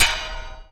Death Counter Sound

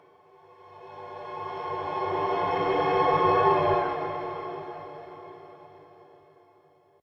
echoes; thief 2014 gamerip

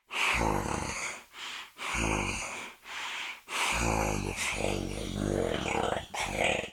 walk

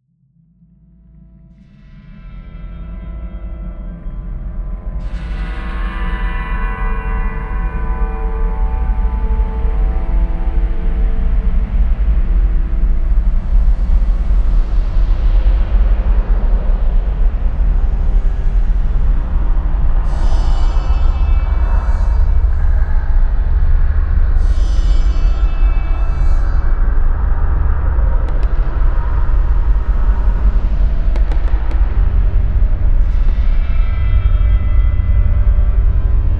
Breath; Nehrim At Fates Edge

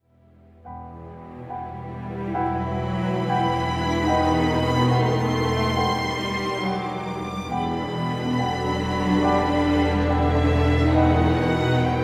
Field BGM; Xenoblade Chronicles 3

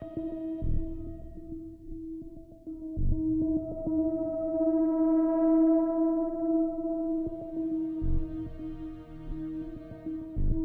K.I.A Str Pads; sniper ghost warrior 3 gamerip 2017

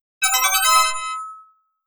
Selection Screen Fanfare; arcade disc in jaleco variety

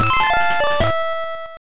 Lose Race; lego stunt rally 2000 game boy

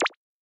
Show Award Bubble; Boomerang Fu

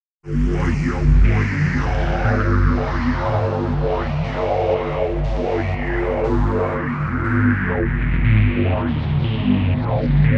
Last Chaotic Ambience; Katamari Damacy Tribute; Katamari Takeshi

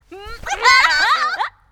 Character Blues Cheer; Angry Birds Epic

